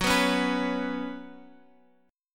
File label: Gb Suspended 4th Gb, down, Guitar, Open F; Gbsus4 strummed